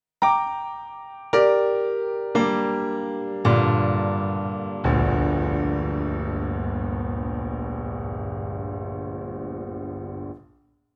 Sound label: Bösendorfer; Alpha HW